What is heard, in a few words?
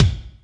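kick Amb Pnch, Alesis D4; 300 Drum Machines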